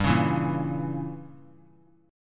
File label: G Diminished 7th; strummed; JGuitar